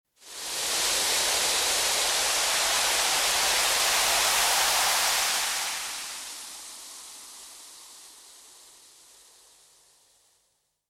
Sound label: beer, sizzle